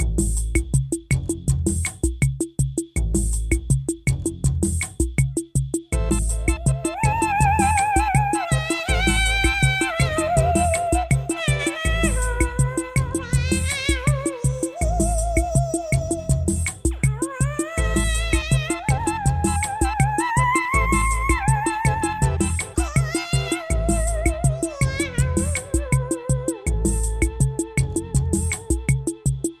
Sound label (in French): Orgue Hazmond